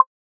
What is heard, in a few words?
pock